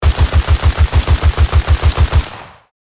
HK416 assault rifle short burst

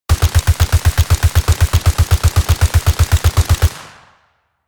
HK416 assault rifle full auto fire

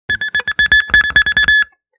Electric guitar multiple tapping

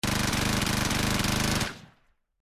M134 minigun firing long burst

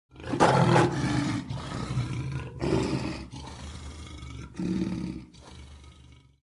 African male lion roaring

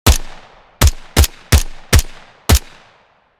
M4 assault rifle multiple shots; gfxsounds

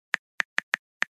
Mobile phone texting

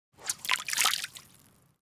Casting the fishing rod into the water

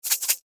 Salt shaker shaking; cooking

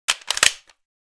Assault rifle mag insertion reload sequence

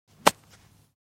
Golf sand wedge swinging with impact

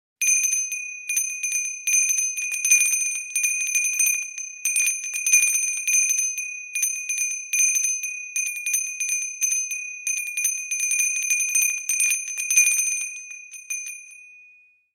Small handbell ring long version

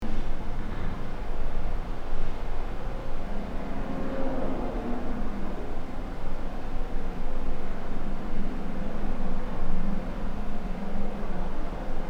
School ambience empty classroom tone loop

